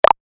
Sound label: move